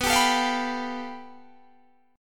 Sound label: A 7th Suspended 2nd Sharp 5th